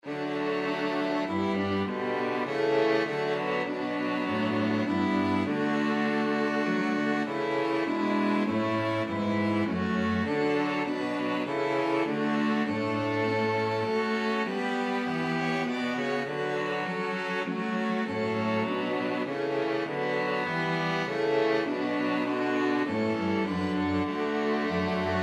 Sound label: string quartet; be thou